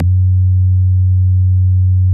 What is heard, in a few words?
SMACK F#2, BASSES